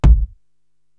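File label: BD, bass drum, drum machine; Korg TR-Rack; Voodoo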